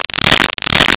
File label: SHUFFL; WINSWIM